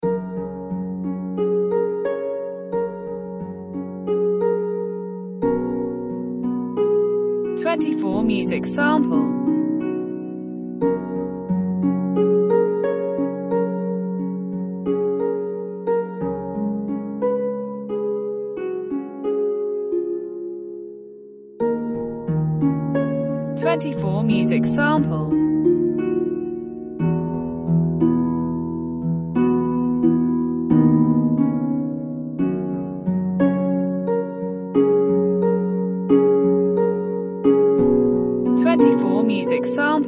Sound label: close harp